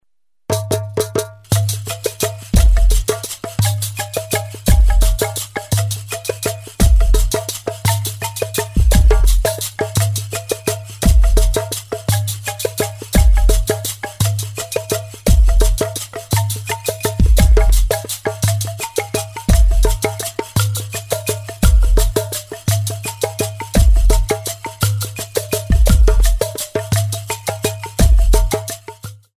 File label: swings; horton